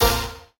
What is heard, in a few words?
scatter short; spinsane